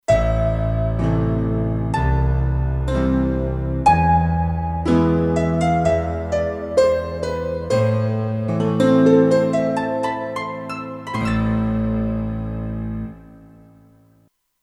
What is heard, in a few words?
Haunted Piano; Factory Programs